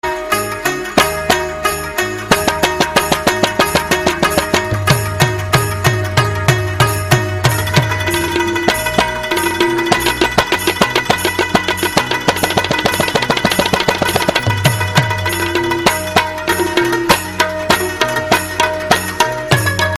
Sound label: pashto rabab jhankar ringtone